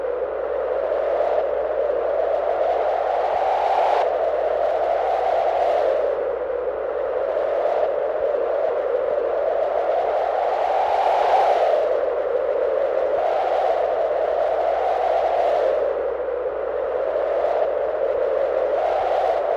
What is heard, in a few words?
wind howl